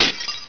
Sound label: crush; columns